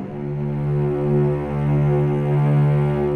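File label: D2, strings; East West; The Ultimate String Collection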